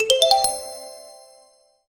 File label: a soft uplifting chime; children